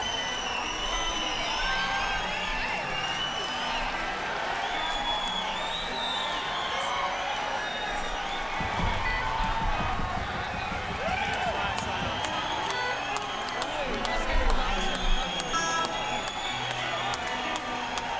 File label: Crowd